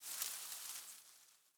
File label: leaf rustle grassy med